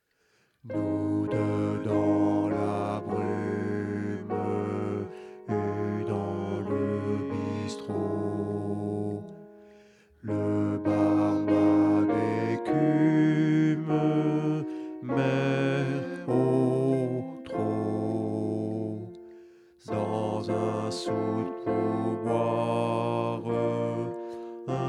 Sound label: tenor